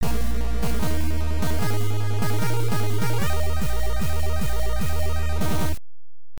Battle Success; overlord nes

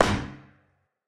Hit Baseball Bat Generic

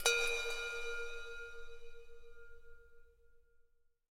Indian Bell

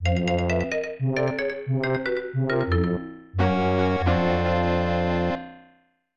Losing Horn

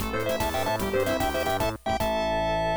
Battle Victory; tokimeki memorial forever with you; ibm pc at

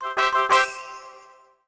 Complete; LEGO My Style Preschool

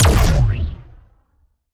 Shot Large Blaster Warped Zapper

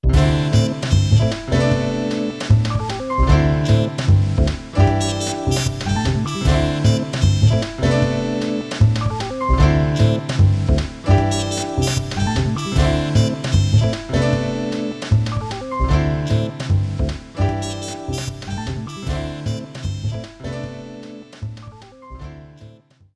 Billiards - Loss; Super Monkey Ball 2

